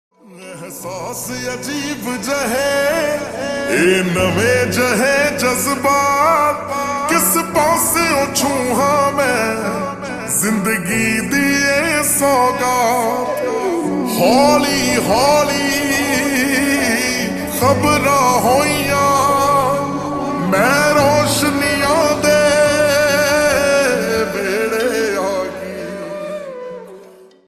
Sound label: Slowed Reverb Ringtone